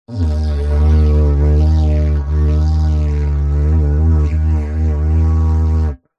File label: foley blowing into plastic pipe vibrate resonated didgeridoo like